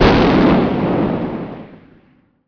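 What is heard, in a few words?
explode; machines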